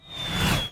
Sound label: jump impact falling; boss; goregrind